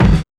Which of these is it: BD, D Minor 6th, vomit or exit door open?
BD